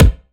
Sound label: Punch Kik